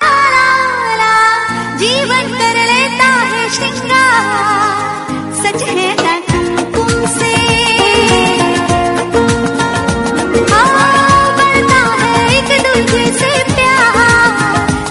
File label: Hindi Ringtone; Kumkum